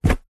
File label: sport rugby ball throw pass let go; zapsplat